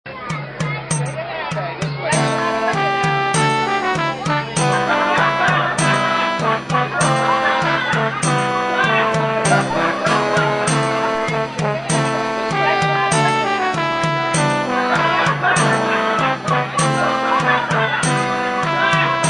Pub Noise; Ahriman's Prophecy; RPG Maker; Elden Pub Noise

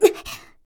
Assault Female

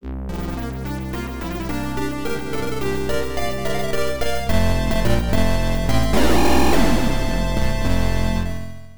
Opening (with SFX); Carat Magical Block; PC-98

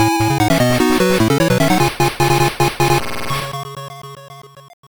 All Clear; Gunstar Heroes; Game Gear

